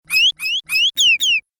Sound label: Arcade Game Bird Sounds; Video Game Sound Effects; Retro Inspired Sound Effects - Think Nintendo, Atari, C64